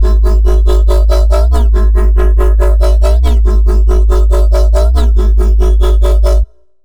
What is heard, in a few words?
Bassline C3 140, Dubstep; GhostHack